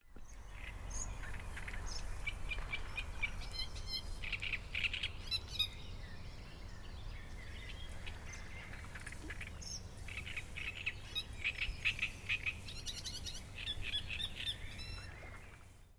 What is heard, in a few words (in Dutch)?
Grote Karekiet; Veerse Kreken